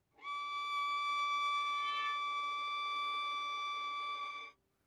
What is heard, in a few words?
Violin arco mf sul D D6